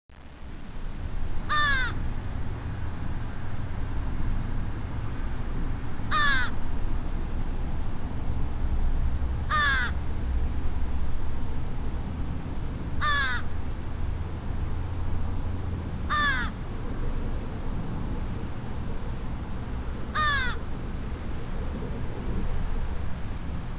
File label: crows